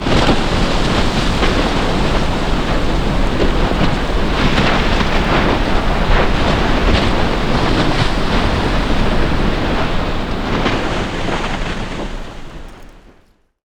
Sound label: eruption